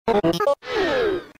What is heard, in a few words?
glitch